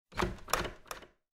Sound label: door rattle